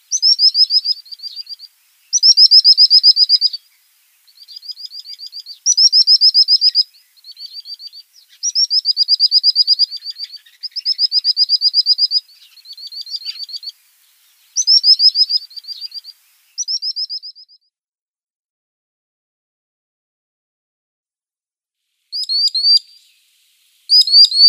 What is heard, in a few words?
Common Sandpiper calls